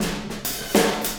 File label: Wormhole Beat, Processed Drumkits